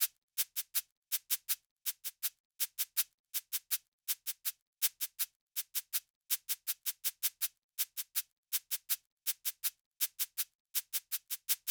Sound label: shaker, Drum Tracks, 081 Cmin